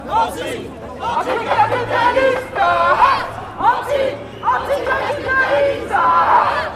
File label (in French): chant